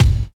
sharp kick single hit F sharp key, bass drum sample tuned to the F# note; kick drum samples